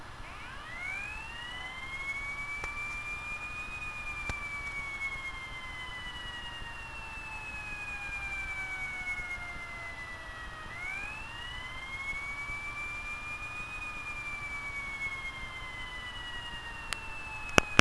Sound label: police